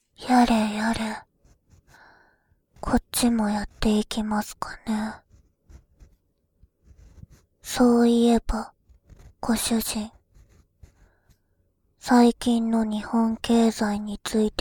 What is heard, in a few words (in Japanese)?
メイドさんの耳かき, ASMR